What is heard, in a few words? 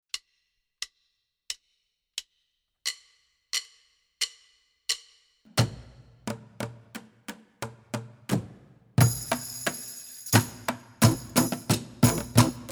Percussion; Hungarian Dance 5